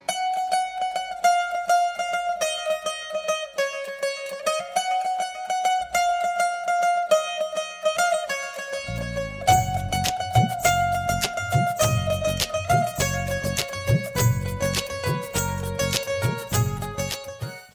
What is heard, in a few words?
guitar